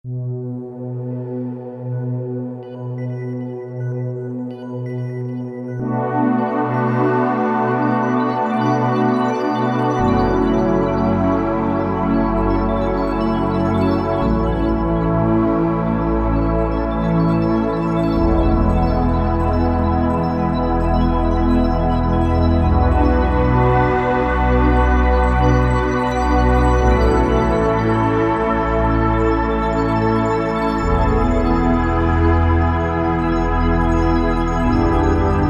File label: Glimmer Pad, Alesis Z2